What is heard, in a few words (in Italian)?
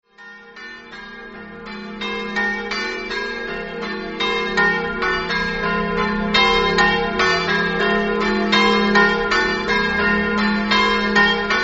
pignano, campane